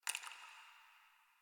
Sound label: Ice Crunch